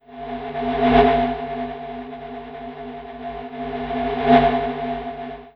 Tourne

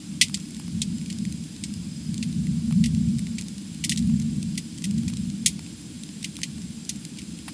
feu mix

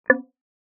bois

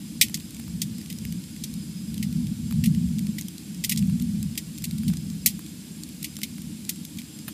feu mix

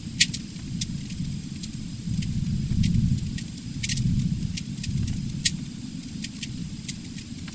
feu mix

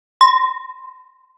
verre

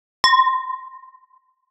verre

